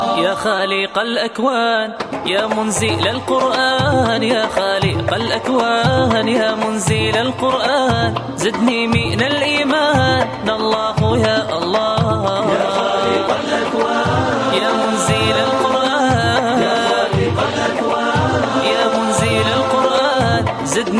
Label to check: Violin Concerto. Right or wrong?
Wrong